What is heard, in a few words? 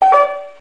error sound